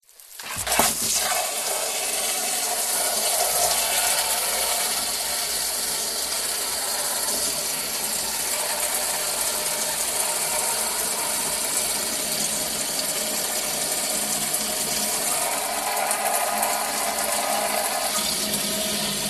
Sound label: industrial cannister large plastic fill with water from hose